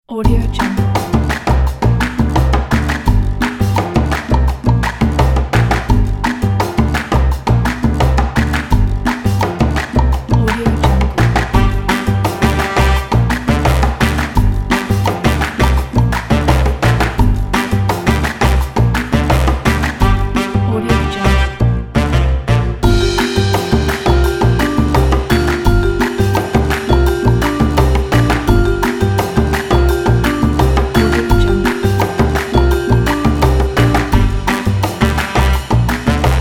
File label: quirky fun retro